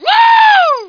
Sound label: whoo